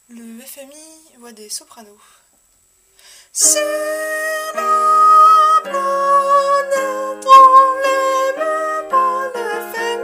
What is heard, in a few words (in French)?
soprano acc, chorale voix